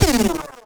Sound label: Lazer Fire